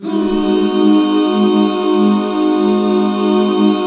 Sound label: HAL AB 7, BACK CHORDS, Roland; Sound & Vision - Gigapack II CD 1